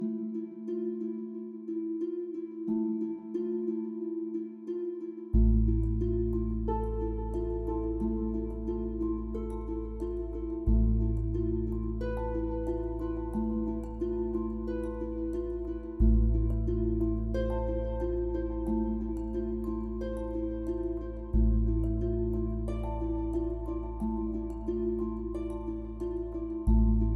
caves main bgm loop

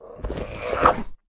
Pillow Monster Suction

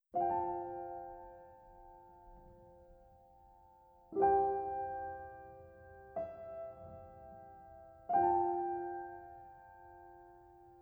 Piano Only; Xenoblade Chronicles 3

